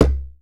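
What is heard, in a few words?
LARGE CERAMIC, DUNBEK; Voice Crystal - Steve Reid Definitive Percussion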